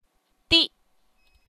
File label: tone